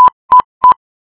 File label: EEE, balises morse